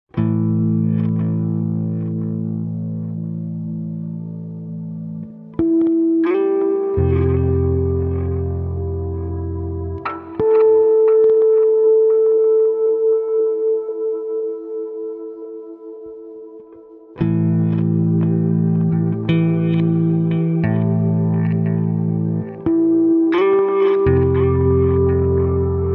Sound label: Forest